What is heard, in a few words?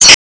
Brewing Pipes; Minecraft: New Nintendo 3DS Edition